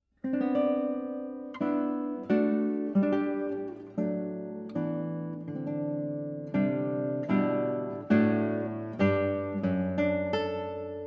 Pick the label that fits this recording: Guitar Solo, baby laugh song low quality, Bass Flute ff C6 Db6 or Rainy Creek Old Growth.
Guitar Solo